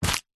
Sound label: plastic packet cellophane containing small object grab pick up